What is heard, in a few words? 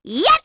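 Vox Attack, Korean; Hanus; kingsraid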